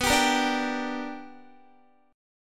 B-Minor Major 7th Sharp 5th B down Guitar; strummed; 4E,4A,5D,5G,5C,6F

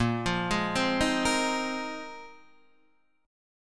Bb 9th Suspended 4th Bb down Guitar Open D

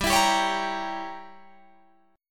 G Diminished 7th, Guitar, Drop D

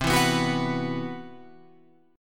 C Major 7th Flat 5th C down Guitar Open F; strummed; CM7b5

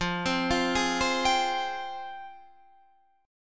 F Minor Add 9th F; arpeggiated; guitar; JGuitar

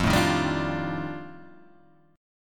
F 13th F, chord

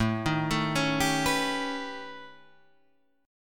A 9th Flat 5th, chord; A9b5; arpeggiated; JGuitar

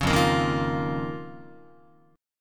F 7th Suspended 4th Sharp 5th F down Guitar

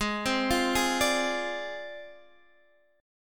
G sharp 6th Flat 5th, Guitar, DADGAD; x,11,10,10,11,12; down; chord shape

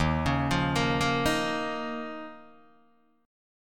D sharp 7th Suspended 2nd, Guitar, Open D

